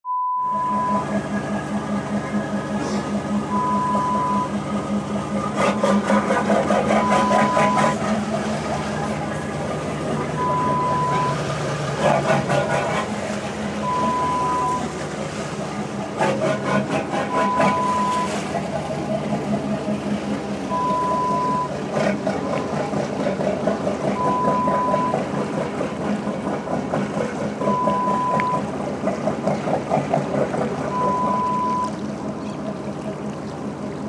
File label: Marina Ambience sound